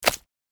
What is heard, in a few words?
Worm falling impact on surface; slug and worm